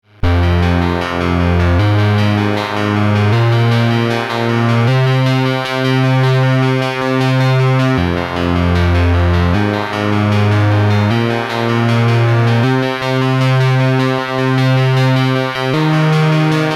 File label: sub osc drone tone